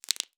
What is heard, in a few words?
Multiple Small Pops